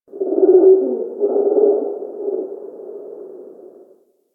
Forest Creature Night Monkey Low Distant; Warframe; Grineer Forest

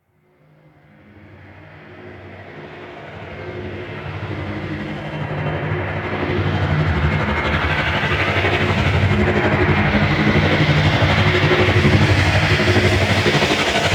Bridge Generator; Call Of The Tempestarii; Wraith Quest; Reaper On Bridge

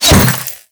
Glass Unbreakable Impact

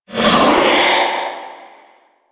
Wraith Reaper Vocal Jump; Warframe

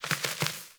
Sparrow Three Wing Flaps; Animals

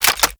Sniper Rifle Reload; Warframe; Tenno

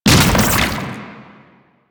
Octopede Horrors Arm Spawn D, Sounds Enemies; Warframe; Man In The Wall

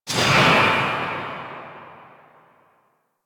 Gunblade Automatic Tail, Weapons

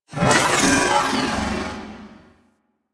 Corpus Ship Metallic Data; Ambience; Warframe; Corpus Ship Remaster

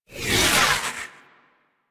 Osprey Dodge; Warframe; Corpus

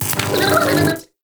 Shock Mote; UI; Motes

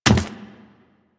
Chimera Step Default

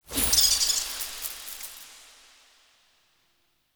Rat Mote; UI; Animal Motes

